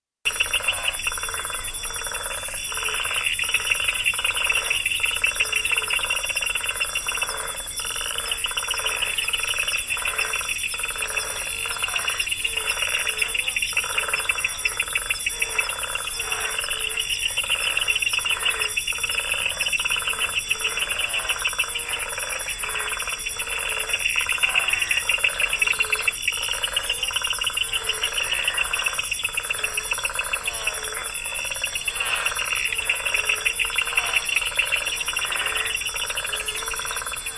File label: Night time African bush sounds